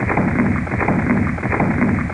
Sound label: water wheel; miscellaneous sounds